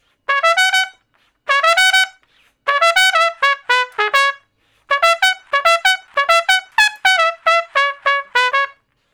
Trumpet Straight (Db), Horncraft for R&B Solos

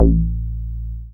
Bass SH-101

